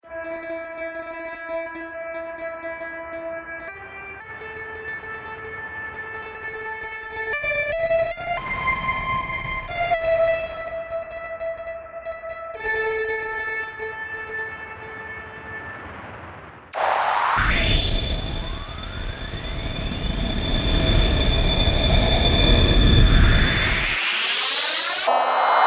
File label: Noise XL